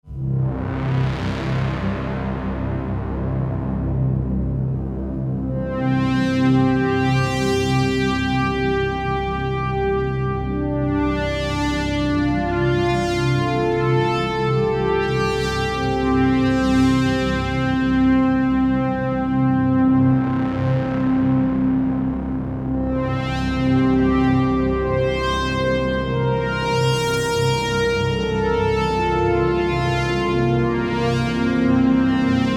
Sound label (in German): n6 schön analog; tyrell